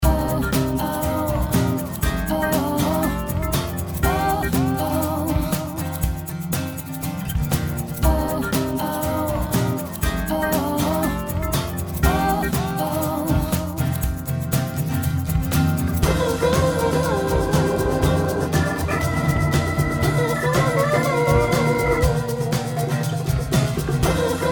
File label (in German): iZotope rx8 song ohne bass